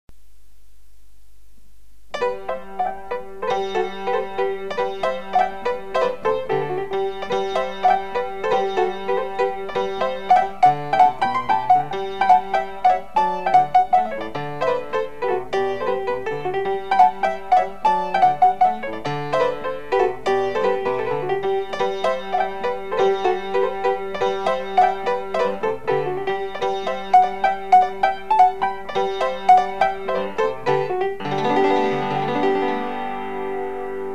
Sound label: gigue